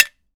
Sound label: Grenade Bounce; zps; Weapons; Explosives